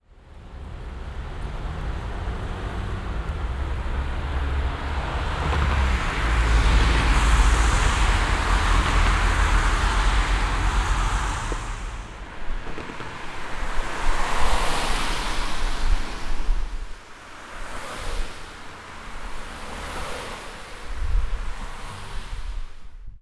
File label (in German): Autos